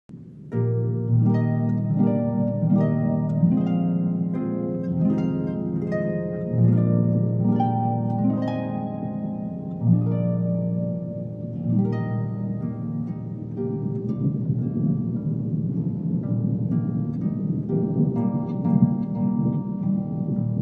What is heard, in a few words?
Harp